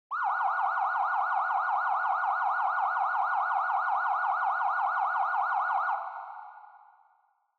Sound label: Police Car Siren Far